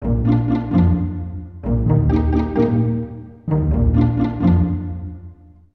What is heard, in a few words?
Unknown Fanfare; PokePark 2 Wonders Beyond; Wii